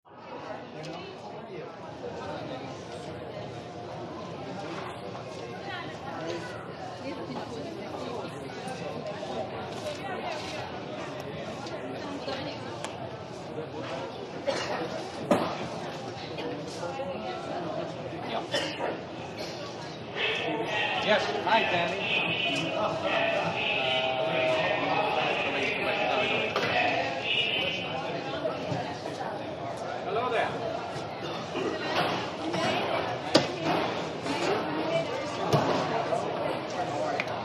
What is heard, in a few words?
Check Area General Bu, airport